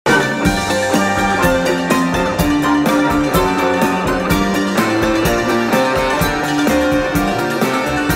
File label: Cimbalom